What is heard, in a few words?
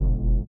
LIQUITAR; Spectrasonics; Metamorphosis